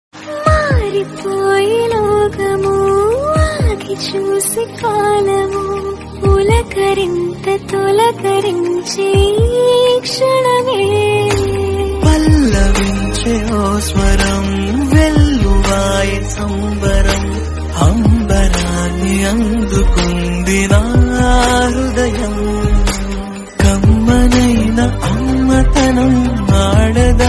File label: family love telugu song